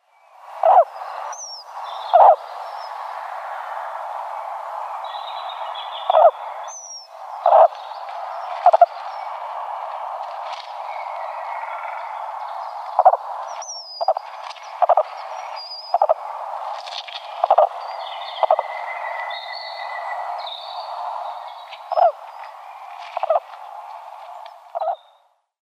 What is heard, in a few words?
Eurasian Woodcock female calls